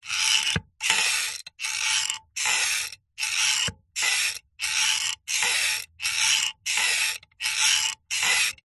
industrial metal lever pump squeaky creaky slow action; zapsplat